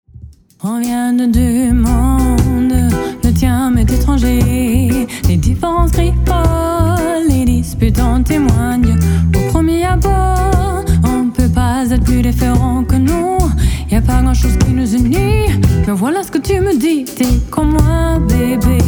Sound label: Acoustic Live Session